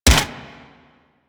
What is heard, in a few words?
Swarm Rifle Shot; Warframe; Infested; Shock Swarm Rifle